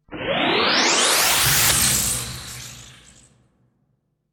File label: Phaser